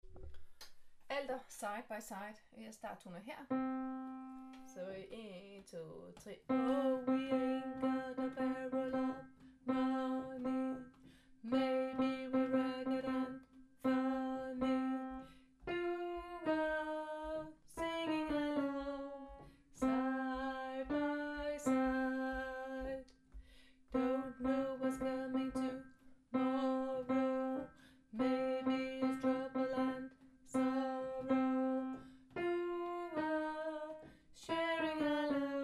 Alter, koret

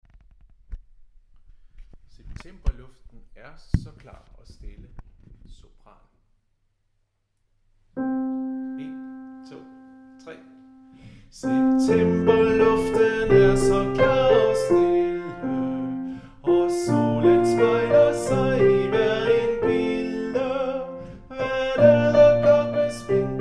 sopran, koret; Septemberluften er så klar; harlev koret

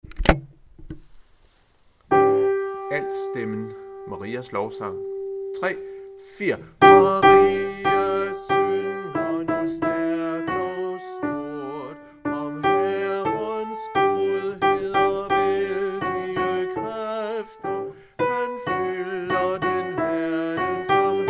Marias Lovsang alt; harlev koret

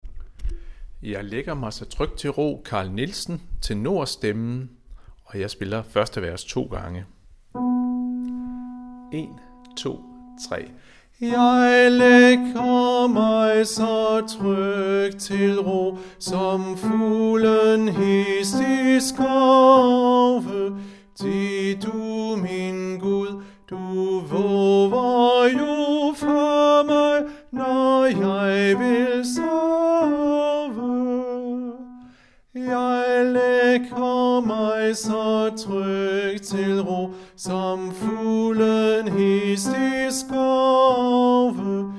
Jeg lægger mig så trygt til ro- tenorstemmen; harlev koret

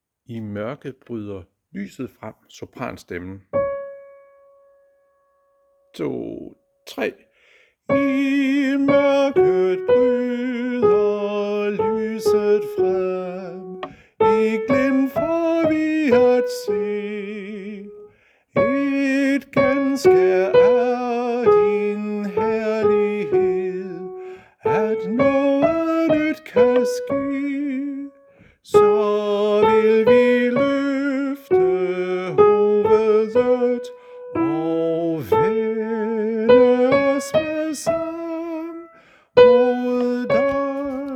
sopran; harlev koret

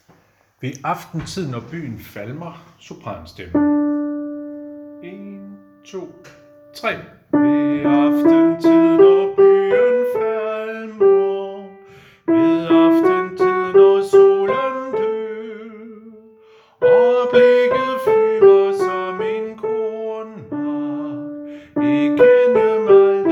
sopran; Ved aftenstid når byen falmer

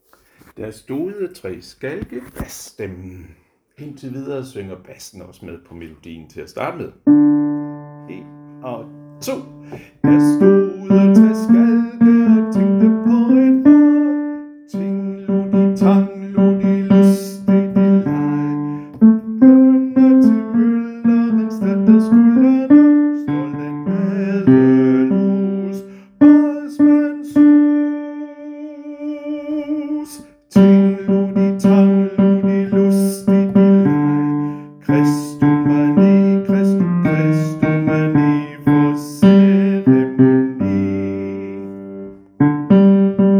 bas; harlev koret

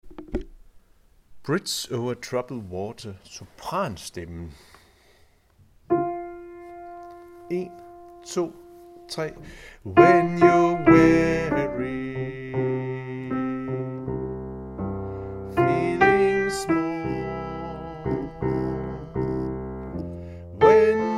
sopran

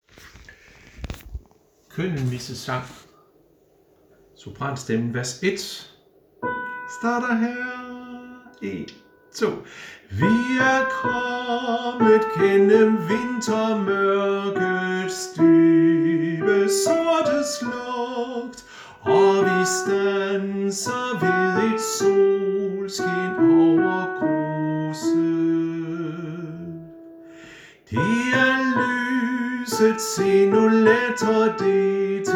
sopran 1; Hein Kyndelmissesang

